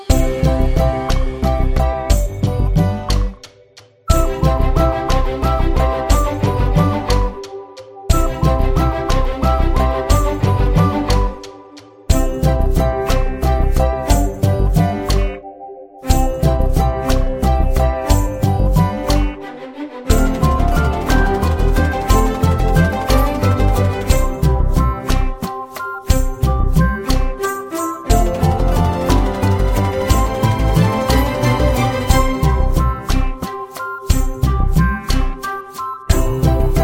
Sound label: Karaoke